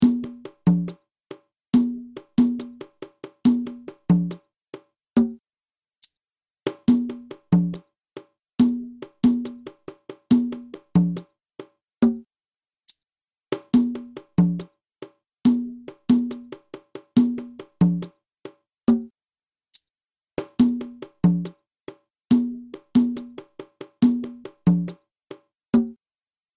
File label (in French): guarapachangueo cajon lent; Naissance du Guarapachangueo